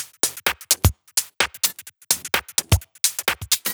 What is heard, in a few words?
Drumloops 128BPM